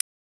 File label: Burst; Sound Illustrations